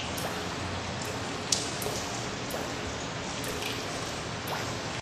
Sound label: water drippling